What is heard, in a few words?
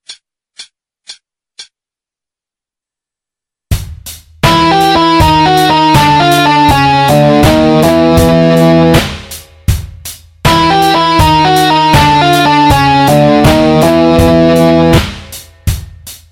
blues ending phrase, 80